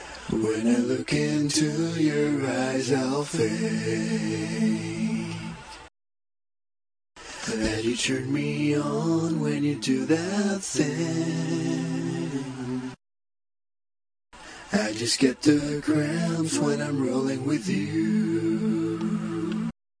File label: male vox, acapellas; VOCAL ACAPELLAS